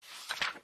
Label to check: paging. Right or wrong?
Right